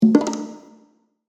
Multimedia Percussive, Technology, Multimedia, Percussive, Element; BlastWave